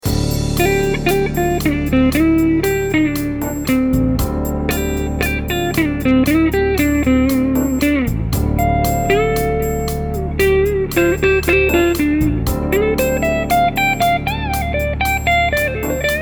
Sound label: Custom Guitar; Siracusa